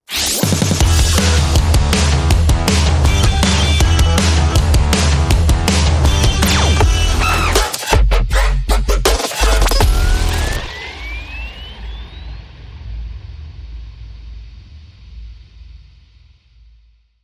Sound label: Countdown